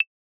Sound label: secondary button